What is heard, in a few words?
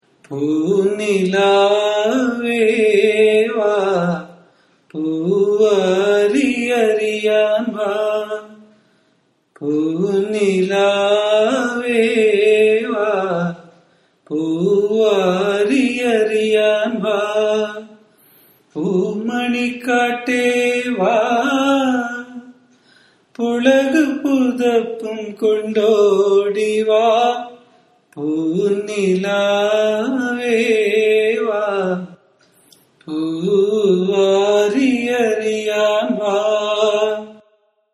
raga begada, carnatic music